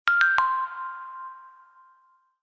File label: Received Signal, notifications; Infinix; XOS 12